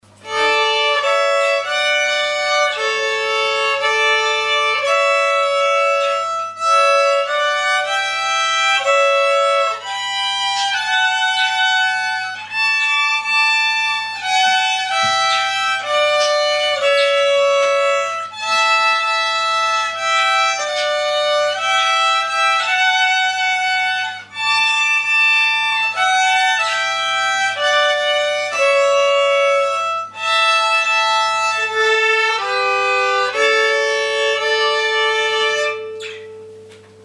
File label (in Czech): husle; huslicky muzika sa tahle